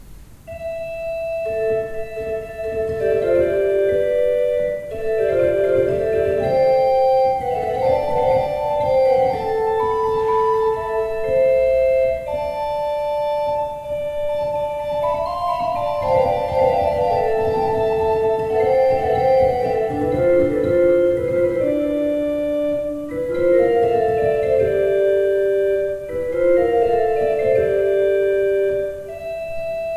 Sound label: Graf, pipe organ